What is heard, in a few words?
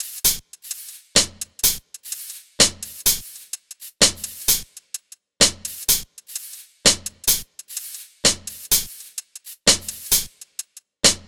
Drums 85bpm Hi Hats Loop Hip Hop